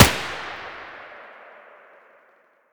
mdr muzzle mono distant, weapons

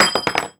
Shell heavy wood; STALKER; IWP

